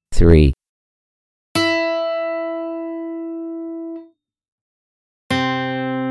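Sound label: 3rd 12 String Key C; designated degree ear training; key to your kingdom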